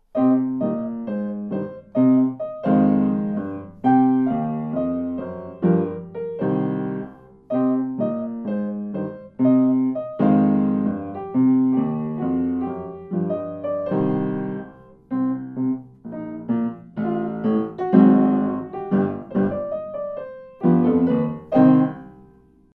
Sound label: Jazz Blast